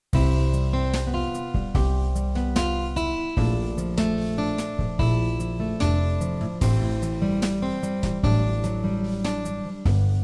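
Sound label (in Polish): Zima zima za oknami instrumentalna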